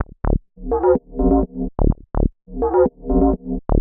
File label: 126 D BASS, Funky Underground bass loops; Loopmasters; Funky Underground Vol 2